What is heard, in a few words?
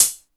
STE HAT; East West - Phat & Phunky